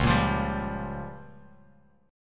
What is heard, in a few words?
Db 6th Flat 5th Bass Standard, Db6b5 chord; Db6b5 strummed; JGuitar